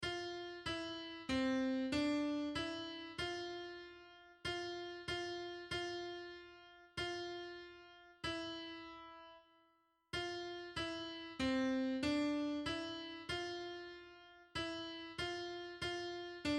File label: Ave Maria A mid; Arcadelt